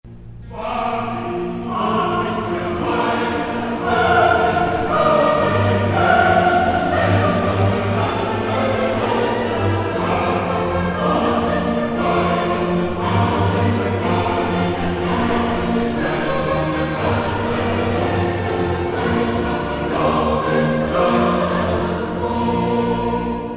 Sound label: Chorus; Keiser; Wahrlich du bist der einer